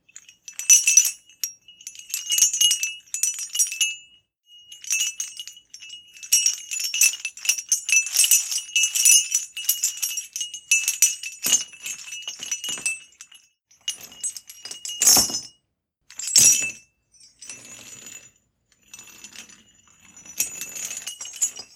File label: Chains Heavy